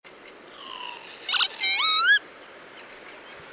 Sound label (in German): Kiebitz; Niels van Doninck; xeno-canto